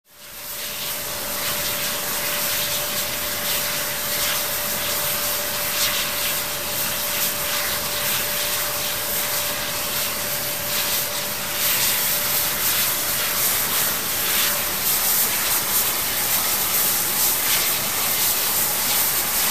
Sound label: Steam Hiss Or Spray, factory; fan